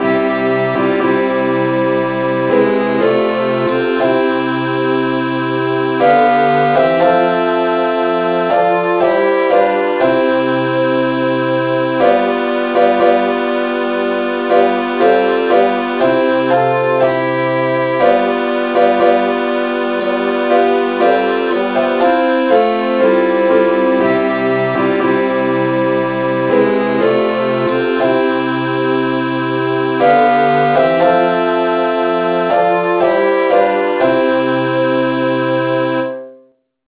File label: satb key f